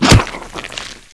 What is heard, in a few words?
grapple impact, weapons